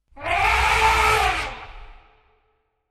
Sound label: Elephants